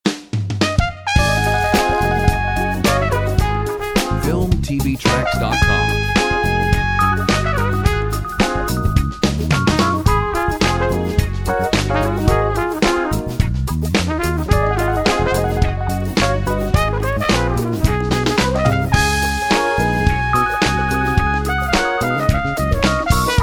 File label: Groove